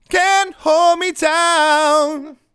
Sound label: Vocals, Male; Can't Hold Me Down